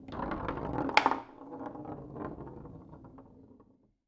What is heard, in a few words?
Foosball Ball Roll